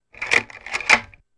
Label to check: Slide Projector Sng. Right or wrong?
Right